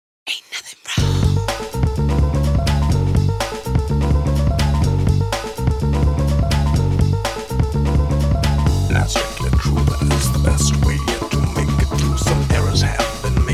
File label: Jazz Mix